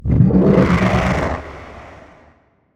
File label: ENDWORM damage